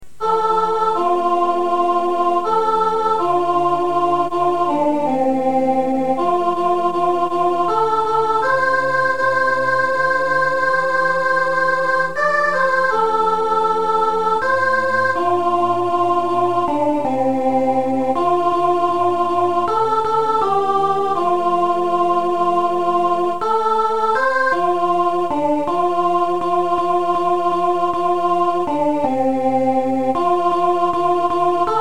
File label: swing low sop